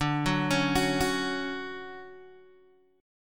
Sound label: D Major 7th, Guitar, DADGAD